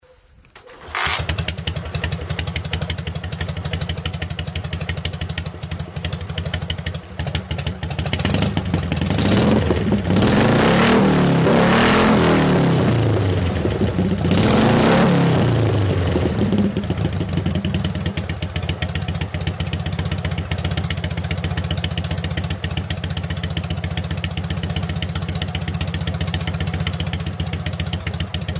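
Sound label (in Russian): zvuk dnepr mt 11, sound of a Dnepr MT-11 motorcycle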